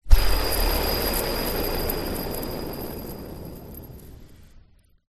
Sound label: leisure toy fidget spinner metal spin short; zapsplat